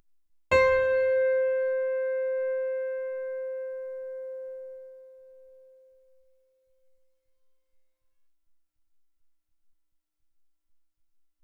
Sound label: piano high